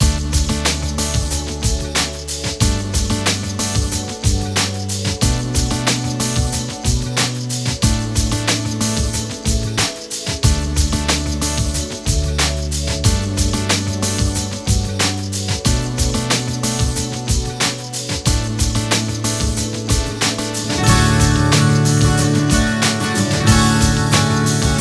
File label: (No Vocals); Generation Girl Gotta Groove